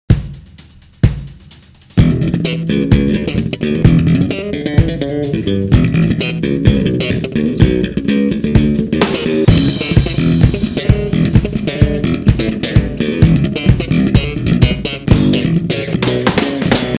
Funk Groove slap groove

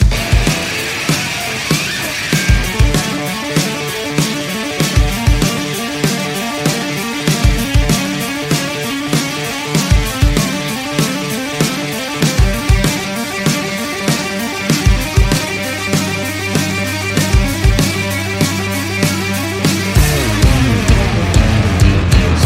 Lick

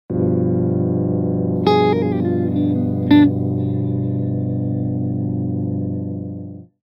Lick

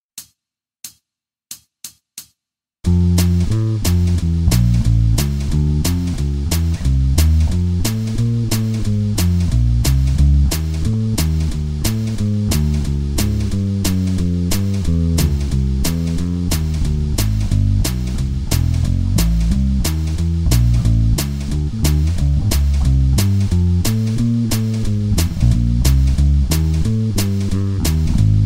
BackingTrack

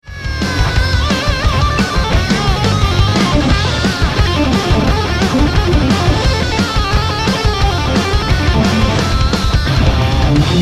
blues section

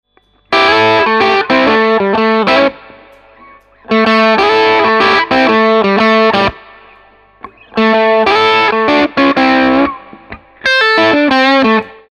SHUFFLE

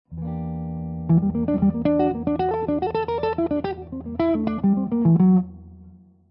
Locrian nat. 2 Jazz Lines, guitar

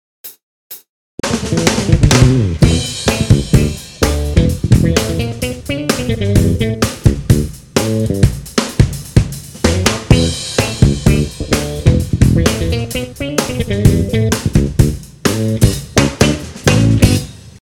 Funk Groove finger groove e tema

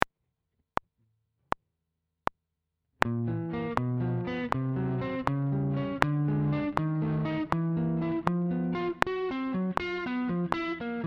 slow 80bpm; Esercizio 5

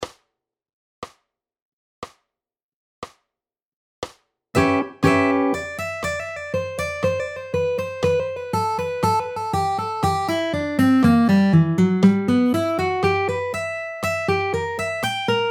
Manouche Gtr Solo Bpm 60

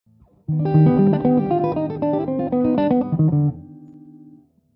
Lydian Jazz Lines

